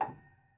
Prepared Piano

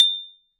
Hole Saw; Hole Saw Bits

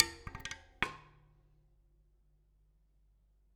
Bicycle Spokes

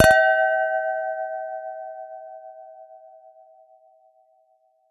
Glass Clink, Beer Glasses; Miscellaneous Beer Glasses

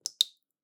Drips

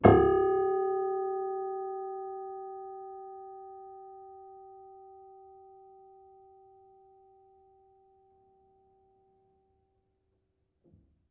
Harm Low, Piano Harmonics

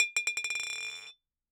Bottle Struck

